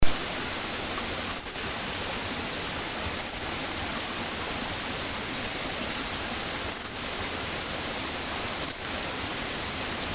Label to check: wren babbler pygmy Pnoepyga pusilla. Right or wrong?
Right